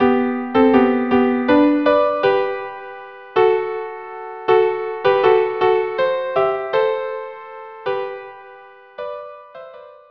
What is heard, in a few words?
viola duo; La Paix